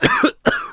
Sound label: cough